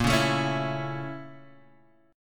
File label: A sharp Minor Major 7th Flat 5th A sharp; A#mM7b5 strummed; JGuitar